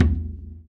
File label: surdo; ILIO; Ethno Techno